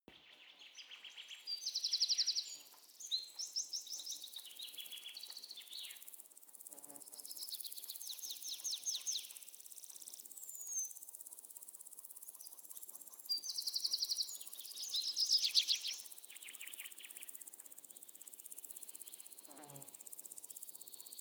day birds insects cricket, wald

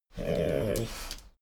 dogo argentino whine grunt; natur-tiere; hunde

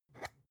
spice jar scratch tonal open fast; industrie wirtschaft; gastronomie

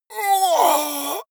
monster gasp; kreaturen

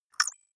click synthetic light, signale

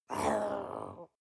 witch growl very soft; kreaturen

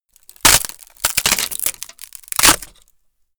wood board small crack; objekte; holz

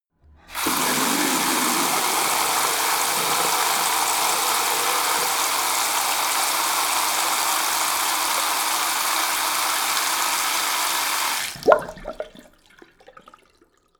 tap flow ultra hard long; haus garten

